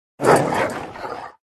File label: dog attack voice